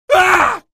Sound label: pain, male; episodic; episode 1; Source engine